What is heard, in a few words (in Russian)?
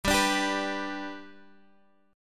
Akkord G lad boj